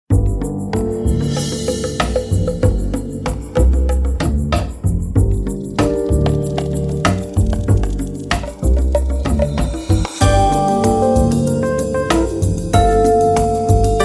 LATIN LOUNGE

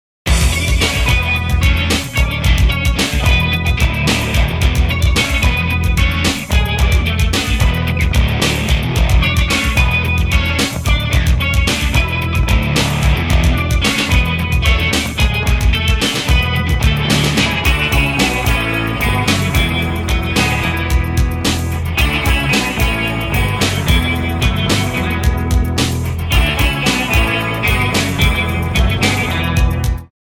SITAR STAR

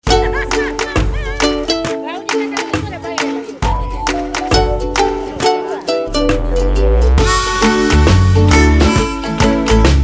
Reggae Uke

